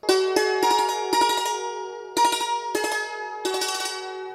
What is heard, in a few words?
Hammer Dulcimer Solo Track; Chinese